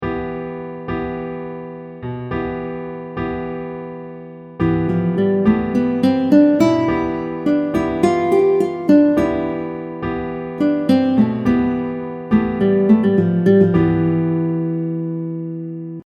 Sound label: E phrygian